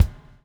BUBL, BUBBLE; Spectrasonics - Backbeat

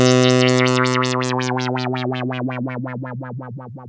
2600 B2, ARP 2600; Hollywood Edge - Giorgio Moroder Rare Synthesizer Collection

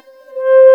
C3 METAL SY, XIANG REEDS; NorthStar - The Wizard VOL-2

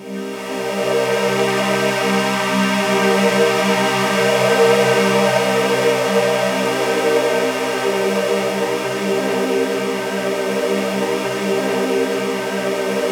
sweep, ambient atmospheres

